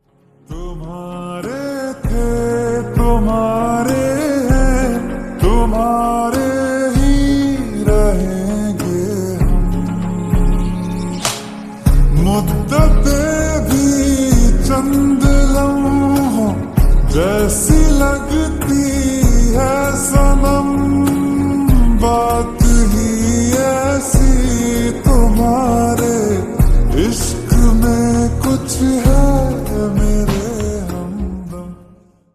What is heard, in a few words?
Slowed Reverb Ringtone; stree 2